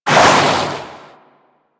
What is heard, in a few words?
Shark Tail Move Fast; Warframe; Grineer Ocean Shark